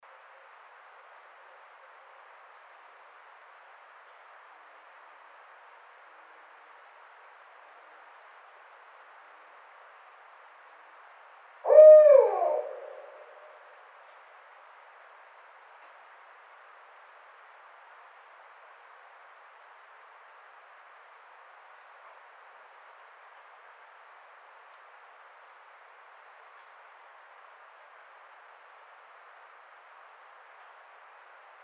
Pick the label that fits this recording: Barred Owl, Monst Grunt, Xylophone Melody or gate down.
Barred Owl